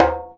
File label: djembe; Univers Sons; Basicussions